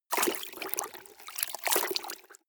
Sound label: clothes water wring medium